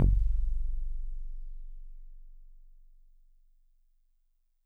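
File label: Solid Bass DX100 F#4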